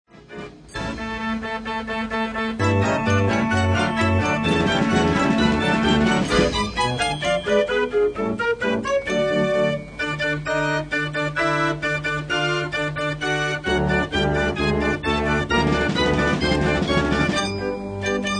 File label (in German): Kirmesorgel